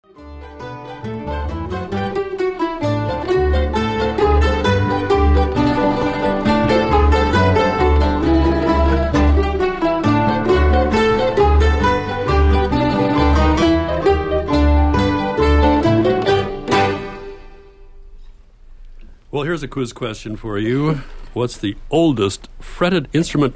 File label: mandolin orchestra; milwaukee mandolin orchestra